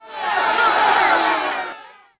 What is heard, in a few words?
CROWD NEG HIGH; Cars; XBOX